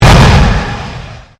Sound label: Convolution Pulse